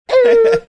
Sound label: making air horn sounds